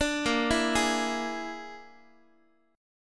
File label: Bb 7th Flat 5th Bb down Guitar; arpeggiated; x,x,5,8,8,7; 4A,4E,5A,5D,5G#,6C#; Bb7b5